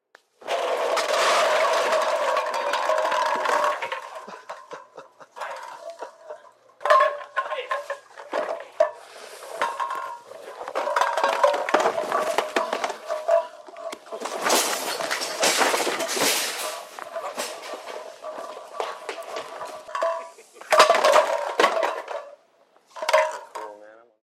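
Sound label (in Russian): металлические предметы вылетающие из машины пивные банки на открытом воздухе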